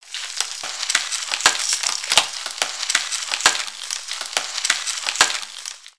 Freeze Wand Crackle

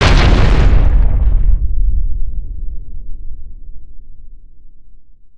Dragon quake Impact; creatures organic

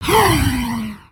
idle, creatures organic

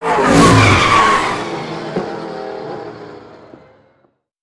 Cars Enter

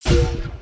Pet Cat Disapprove; creatures pets

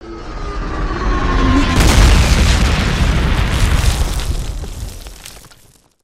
mortar incoming; avant gardens

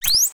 Rat Hatchling Squeek; creatures organic

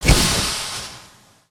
Geiser Burst Small

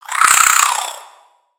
Spider Angry, creatures mechanical; Lego

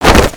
cloth short; creatures organic

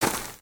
Footstep; creatures organic; Lego; Strombie

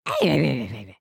Stunned; creatures organic; Lego; Strombie

